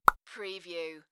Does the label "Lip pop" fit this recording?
Yes